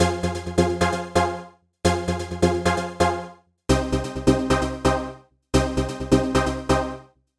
Minor Chord Stabs Pattern; echolab

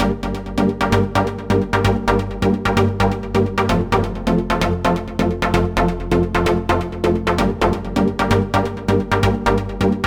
ThirdsStabPattern; echolab

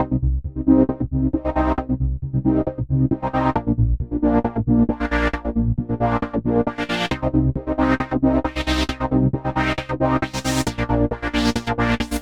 Trancy Pattern; straightliner

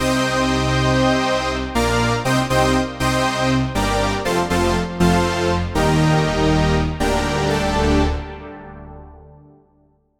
80s Synth Lead; straightliner

